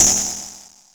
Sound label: Open HiHat, Nord Modular Drum Samples; Yawgmoth